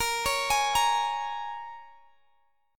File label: A sharp Minor 7th, Guitar, Open G